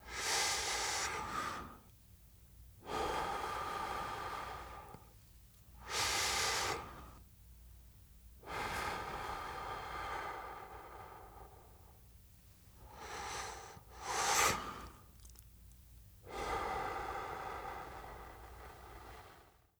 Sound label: detective smoking